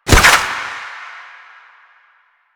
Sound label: Bunraku String Catch; Warframe; Koumei